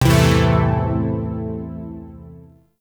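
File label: Shawzin Pentatonic Major Chord A; Zariman Shawzin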